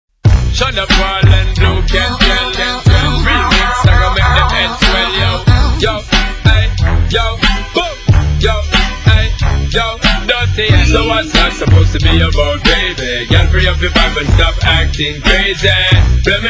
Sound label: Breathe